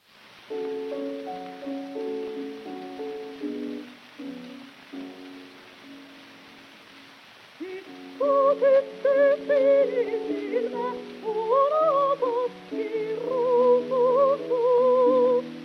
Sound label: Gramophone